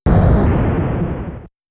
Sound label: Miss; Gaplus